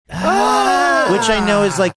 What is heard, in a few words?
funny drawn out ahhh